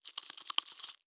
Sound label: Bug Movement; Frogsong